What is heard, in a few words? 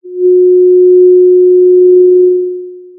pop, Sound of a cruise ship horn